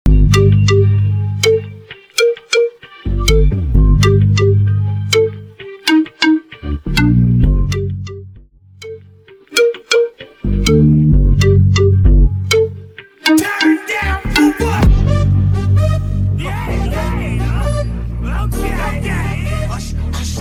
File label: Funk Brasilero Intro Outro 130 BPM; Prodeejay Remix